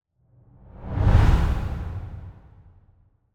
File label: Whoosh Deep Air Whoosh; Hollywood Edge; sound designer's toolkit v2